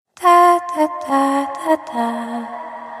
notification

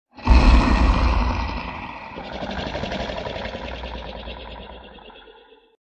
least scary growl

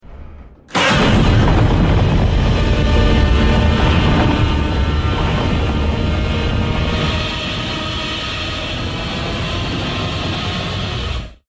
king roar; godzilla 2021

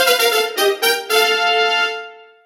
level up modern; dragon quest

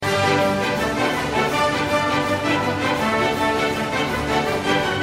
can can music

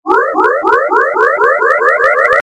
laser turn on; sonic exe

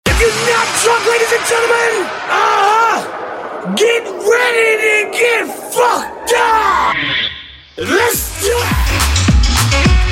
drinking song

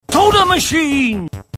soda machine

